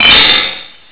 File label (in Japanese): kakin